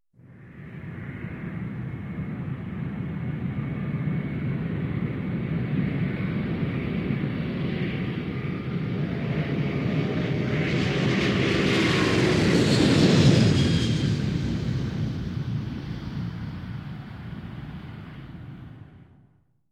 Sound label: Aircraft on runway approaching lifting off